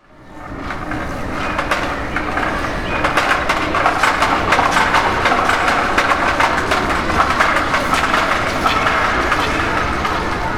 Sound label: NOISES; AKAI Anime Sound FX